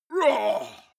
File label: male shout hit